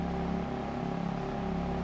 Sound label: idle, vehicles; 458; TDMCarpack; TDMCars